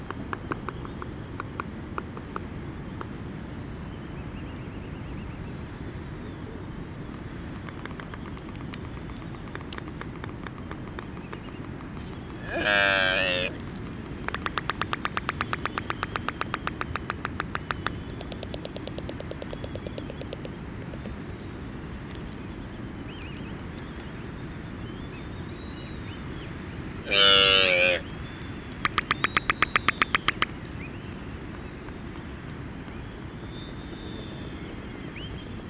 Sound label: black footed